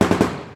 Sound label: Machine Gun Triple